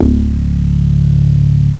FM Bass; SynBass

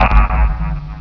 ITEM UP; CHASM

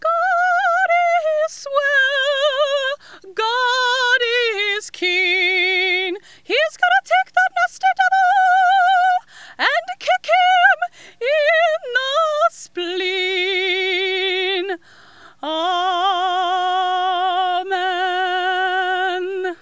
soprano

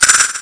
bell

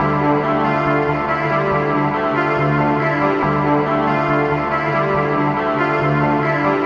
Guit pad; Techno; Melody; TechnoMusicMaker

